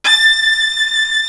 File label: SOLO VIOLIN; East West; Global Sounds